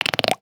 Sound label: Fart; Gully Loops FX 3; aawaz